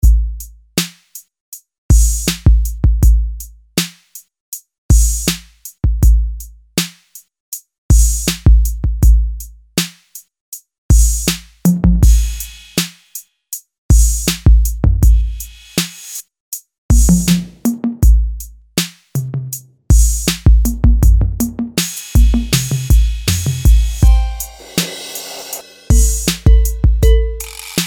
DRUM KITS Hip Hop Kit; Apple GarageBand 2

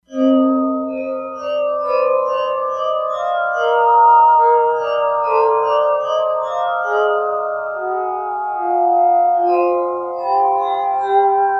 Air Gamelan, E-mu Proteus-3; Factory patches

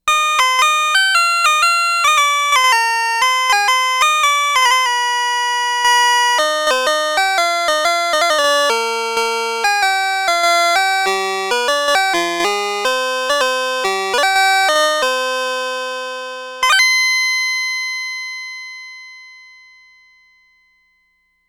Harpsichord, Roland SH-2000

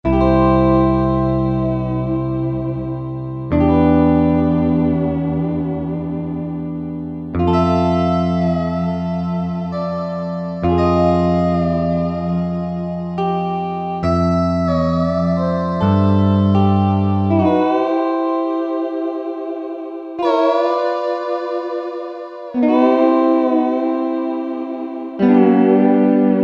Pedal Steel, Alesis QS8; Factory Preset examples

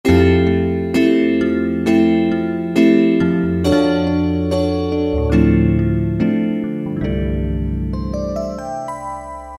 Harmonic E-Piano; Roland PN-D50-02

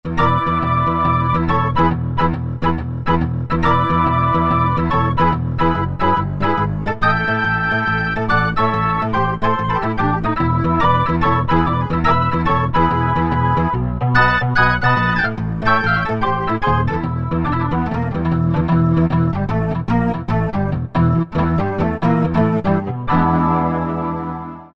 R ORGAN, Roland Guitar And Keyboards

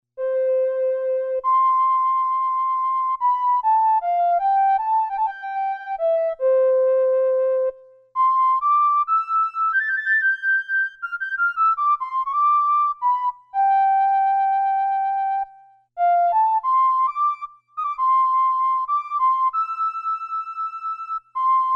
Flute, Casio CSM-1; Tones

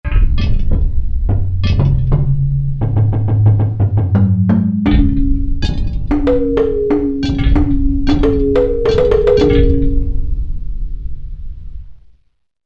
Ethnic Per, Korg MSC-1S; Korg MSC-1S Variety

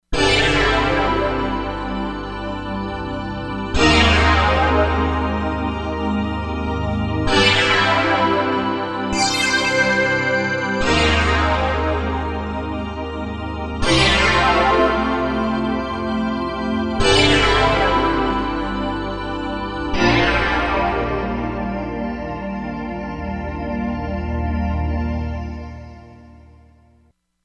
Star Sweep, Korg MSC-07S Synth

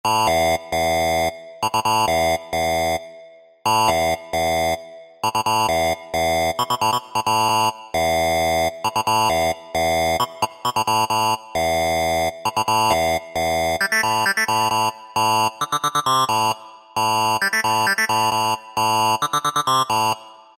RAVE BEEP; Best Service Alpha Dance II

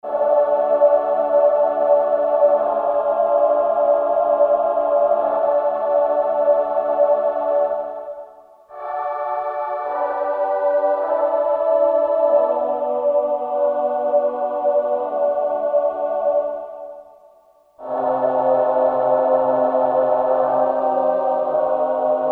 DWGS, Voices, Korg DSS-1; Voices II

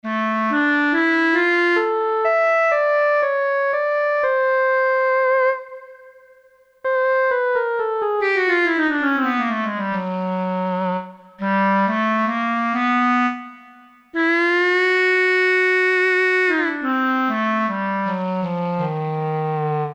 CLARINET, Korg DSS-1; Woodwinds

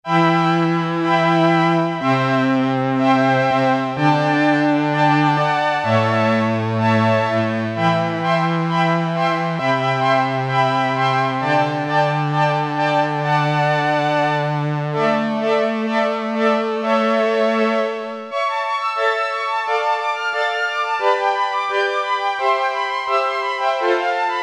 Strings, Casio MT-600; Tones

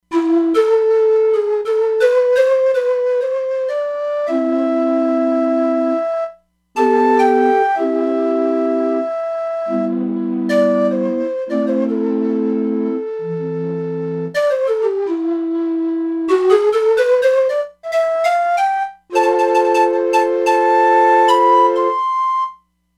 Pan Flute, E-mu Proteus-3; Factory patches

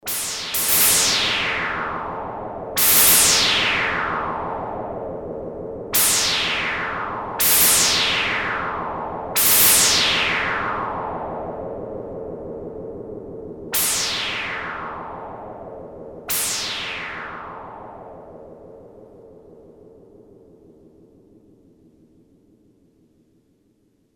Explosion, Casio MT-600; Tones

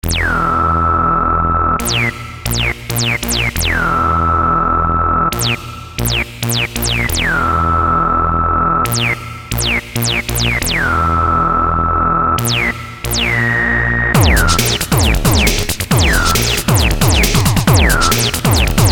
Screeching, Orca; FXpansion

